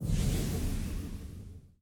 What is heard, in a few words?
shadow gate pass through